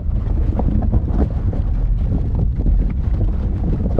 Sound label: reel rumble loop; netent